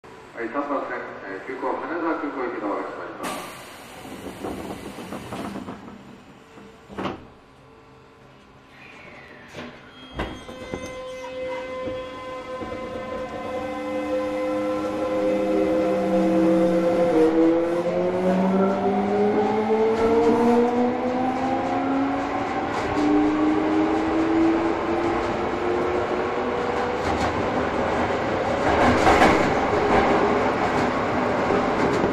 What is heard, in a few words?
vvvf, mitsubishi; toei